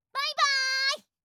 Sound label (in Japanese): 攻撃